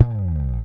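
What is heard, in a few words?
guitar slide; 90bpm; Demo Multitracks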